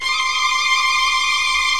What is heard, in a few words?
VIO MAJ TRIL